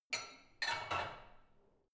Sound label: barbell only replace unsteady soft; sport freizeit; fitnessstudio